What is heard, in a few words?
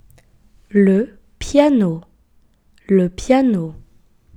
piano